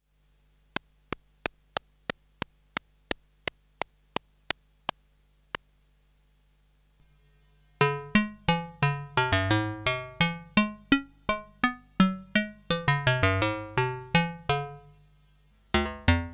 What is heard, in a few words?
karplus, synth; jurgen Haible; sonofstormtide